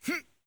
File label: jump; MMFeedbacks Demo